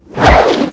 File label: whip sword slash